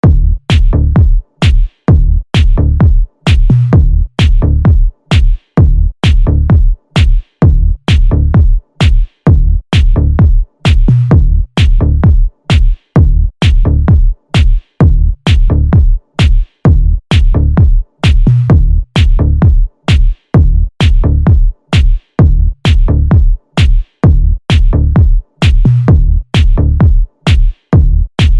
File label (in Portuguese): base mega funk tumdumdum nova classsic house; pack bases mega funk 2020